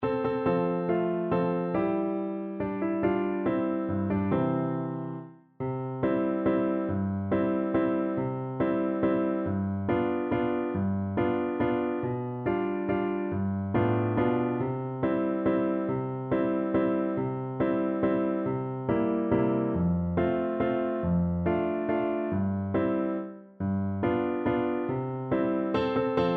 French Horn

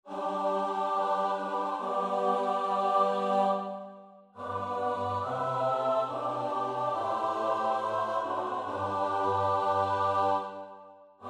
choir; dowland; come again

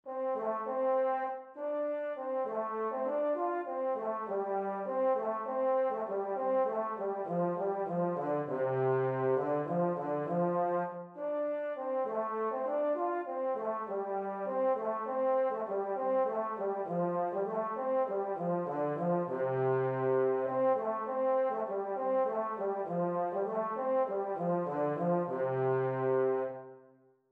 euphonium

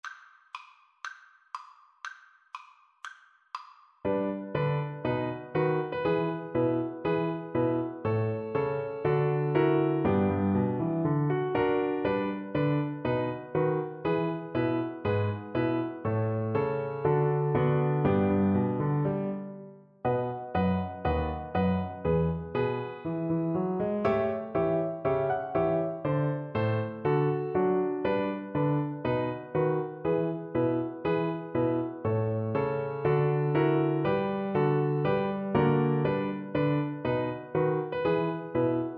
Cello

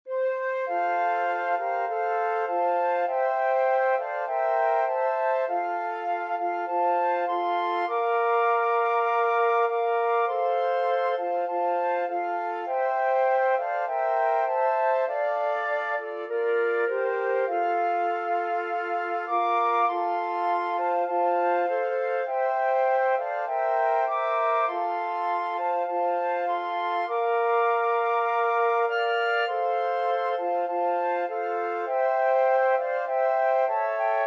flute quartet

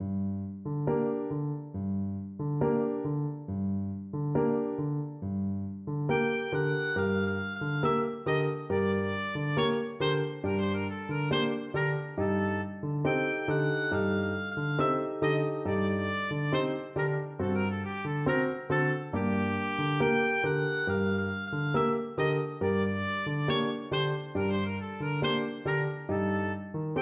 bizet habanera, oboe

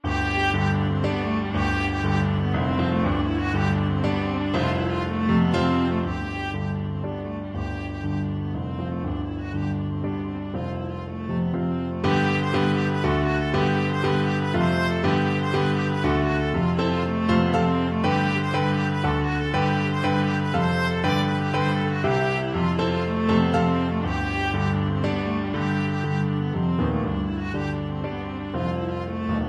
blast of wind, viola

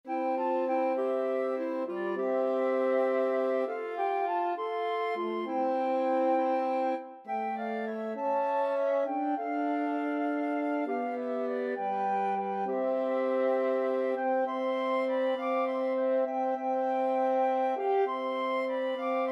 flute clarinet horn

